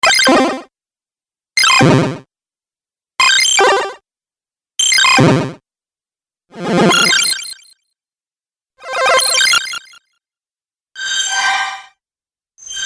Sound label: Retro Random Bleep Accent Sound Collection; Beep Sound Effects